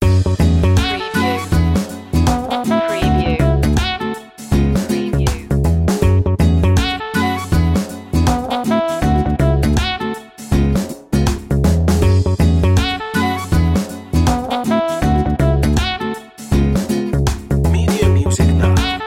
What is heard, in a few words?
spanish mood